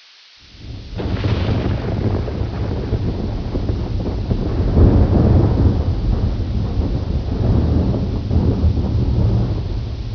Amb Thunder

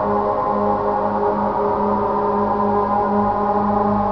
alien beacon; de subsanctum

